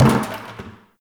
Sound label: Destructible Bucket Impact; Warframe; Ambience; NineteenNinetyNine